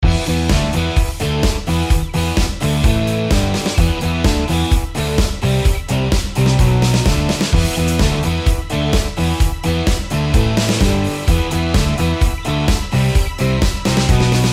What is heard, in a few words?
ACTION